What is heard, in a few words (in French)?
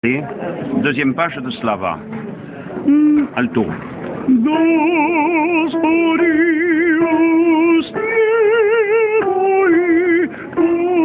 alto